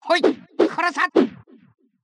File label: double slash; Mystical Ninja Starring Goemon; ebusimaru